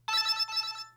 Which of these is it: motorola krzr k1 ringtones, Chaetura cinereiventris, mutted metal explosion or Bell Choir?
motorola krzr k1 ringtones